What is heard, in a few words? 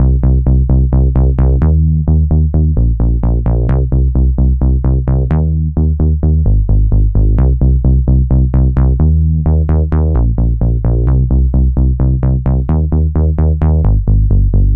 Simul Bass; Dr. Fink's Funk Factory